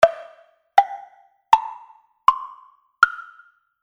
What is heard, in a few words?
temple blocks; temple blocks with mounting bar; grover pro percussion